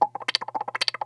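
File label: wah guitar; E-MU Producer Series Vol. 8 – Platinum Phatt; Best of Phatt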